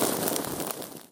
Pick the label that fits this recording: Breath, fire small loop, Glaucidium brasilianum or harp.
fire small loop